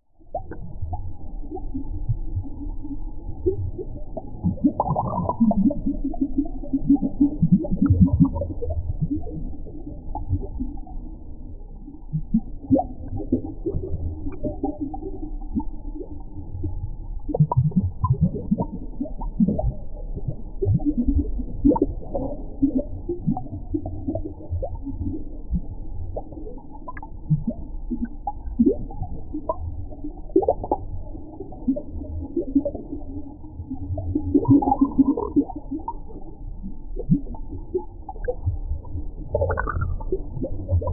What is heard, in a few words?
underwater ambience with, blubbering